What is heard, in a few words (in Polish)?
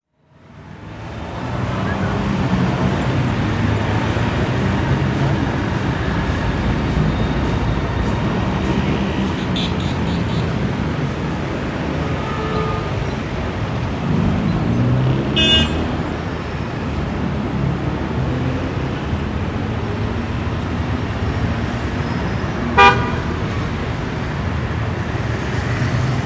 ruch uliczny